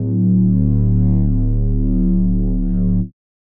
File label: Reese D; Instrument Oneshots; 99Sounds; Monosounds